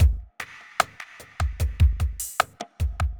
evil beats 75

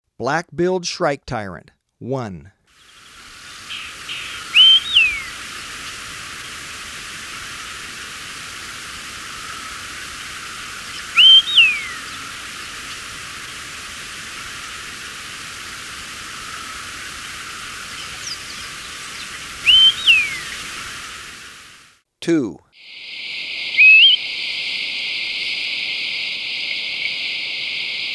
Black-Billed Shrike-Tyrant; S. America

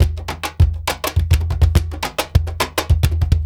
Perc, Percussion Latin

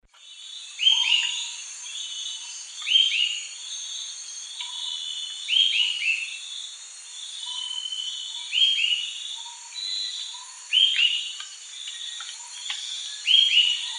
Hypocnemis hypoxantha, call; S. America; Eastern Lowlands

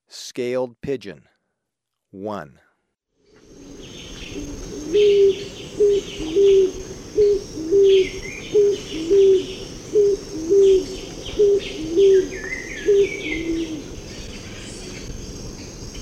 Scaled Pigeon, Birds of Ecuador; S. America

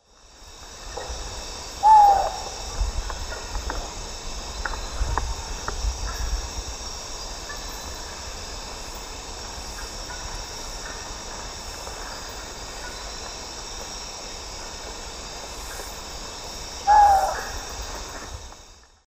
Pulsatrix perspicillata, calls of immature; S. America; Eastern Lowlands

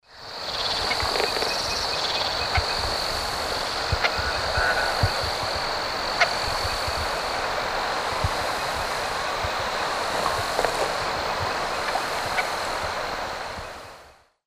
Gallinago paraguaiae, single calls; S. America; Eastern Lowlands

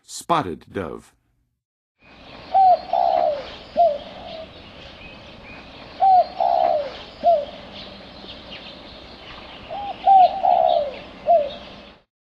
Spotted Dove, Songs of Birds; North America; Western USA; Western USA Songbirds

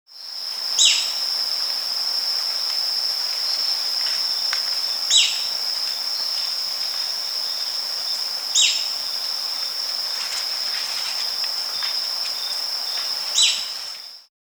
Yellow-Throated Spadebill (Platyrinc, S. America

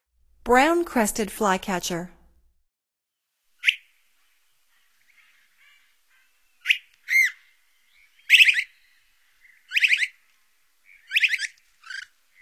Brown-crested Flycatcher; North America; Stokes

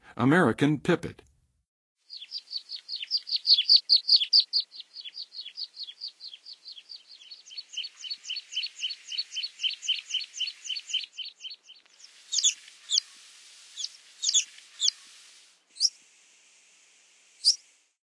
American Pipit, Songs of Birds of North America; Western USA; Western USA Songbirds

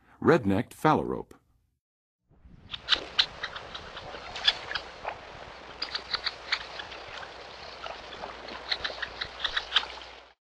Red-necked Phalarope, Birds of North America; Western USA; Western USA Songbirds

